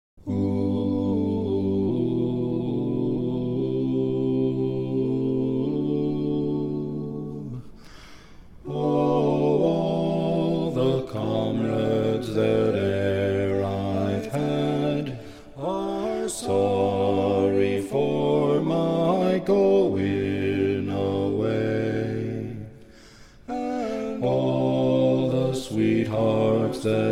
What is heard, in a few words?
Bass; The Parting Glass